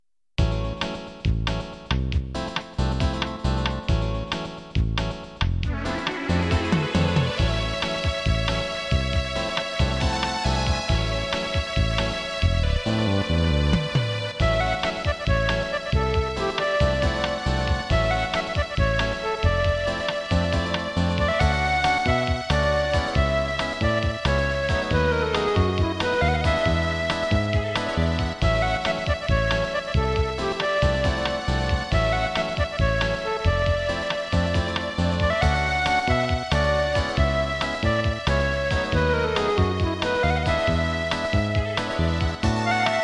quiet atmosphere

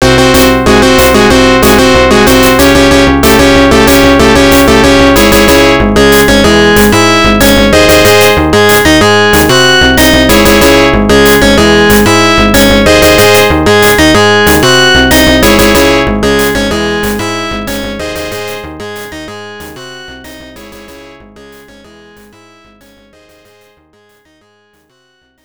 Battle Ship; Hyper Dyne Side Arms; arcade

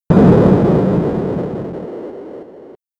Die-Start Up Sound; Galaga; arcade

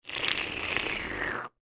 Slurp

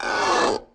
Clench

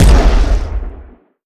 Bombard Explode; we are the dwarves gamerip 2016

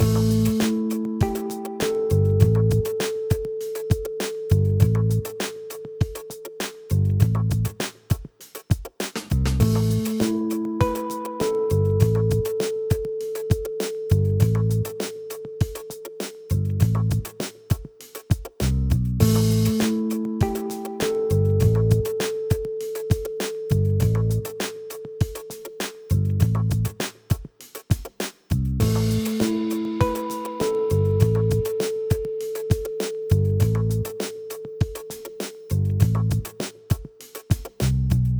Casual Groove Game Loop; Tales of the Tiny Planet

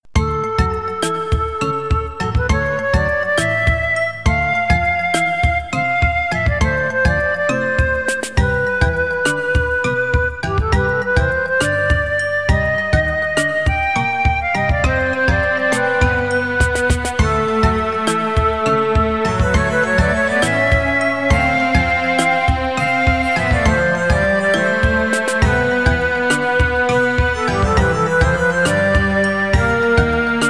Unused Town Theme; Tales of Symphonia; 2004 North America GameCube gamerip

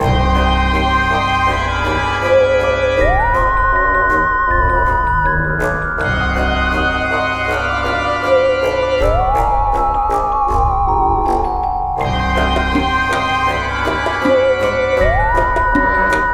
Spooky Theme

